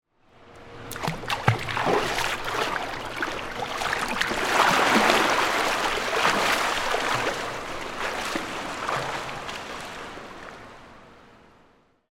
Pool Indoor Enter, Water, Splash, Enter, Stairs, Swim, Away, Indoor, Pool; Pools